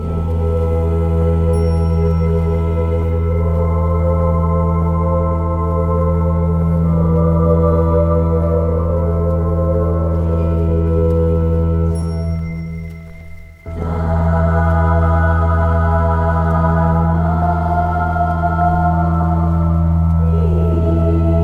keys, Sad Loops

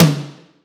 Toms

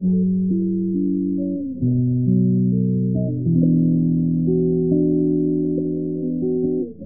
guitar, Phonky Loops

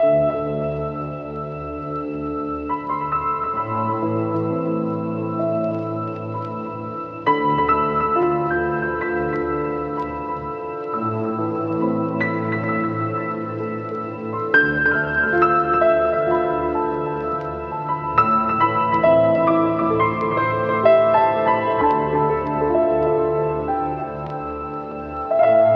piano and strings, sad loops

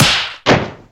slap; Crash Bang Boom

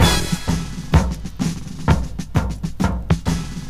march, Drum loops

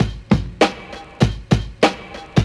stutterences, Drum loops Boombap

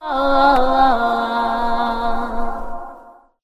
"oh oh oh oh" female SUNG; ooh ahh

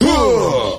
huh, Voice; ooh ahh

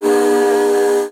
lady ooh, Voice; Notes

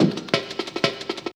jungle, Drum loops

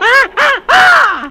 "ah ah ah" female; Voice; ooh ahh

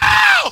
ow

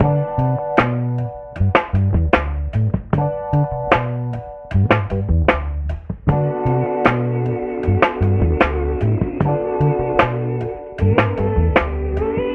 full guitar and vocal sway, Loops Phonky

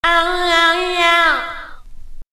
"oyeyeyeow", voice; ooh ahh

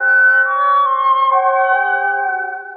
flute dread not, Loops, Sad

